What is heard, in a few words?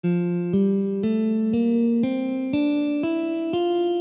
F major